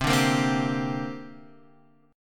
C 7th Suspended 2nd, Guitar, Half Step Down; C7sus2

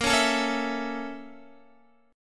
A sharp Minor Major 7th Flat 5th A sharp Guitar; A#mM7b5 strummed; x,13,11,14,13,11

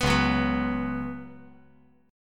C sharp 7th Suspended 4th C sharp

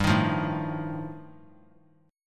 G 7th Suspended 2nd Sharp 5th G chord; strummed; JGuitar

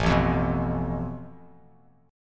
A Suspended 2nd Sharp 5th, Bass Standard; strummed; JGuitar

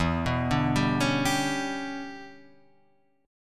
E Minor 13th guitar chord, Half Step Down

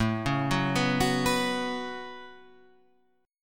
A 6th Add 9th A down Guitar Half Step Down

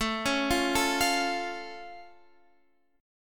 C sharp Augmented, A, guitar chord

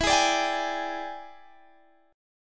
E 7th Sharp 9th Guitar Open G, chord

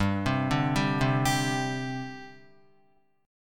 G Suspended 4th, Guitar; arpeggiated; 4F,4A,5C,5G,5C,6E; JGuitar

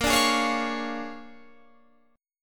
Bb 7th Sharp 9th Bb, Guitar Open F; Bb7#9 strummed; 22,21,20,20,20,20

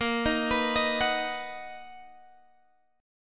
B Major A sharp; B/A#; arpeggiated

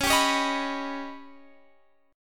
Eb Augmented 7th Db

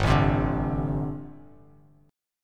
G Suspended 2nd Suspended 4th, Bass Standard; Gsus2sus4 strummed; JGuitar

